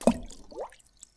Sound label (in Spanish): gotita